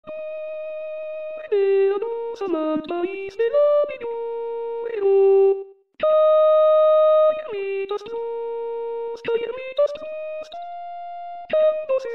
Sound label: soprano; Bigorre